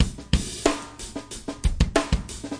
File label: 092 funki classic, drum loops and breaks; 091 to 100 bpm